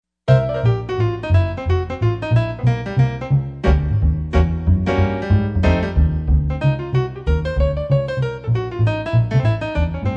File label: WdBass Duo, Yamaha TG55; Factory Presets